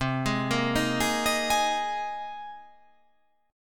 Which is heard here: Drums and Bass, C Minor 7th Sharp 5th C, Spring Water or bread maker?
C Minor 7th Sharp 5th C